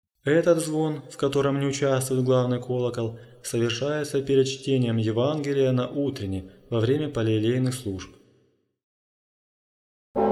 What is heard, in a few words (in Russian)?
ЗВОН ПЕРЕД ЧТЕНИЕМ ЕВАНГЕЛИЯ НА УТРЕНЕЙ